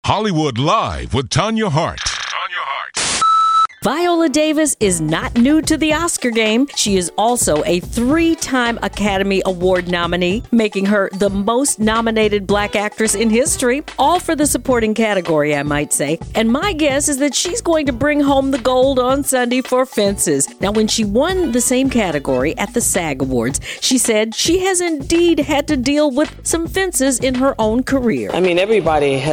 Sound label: viola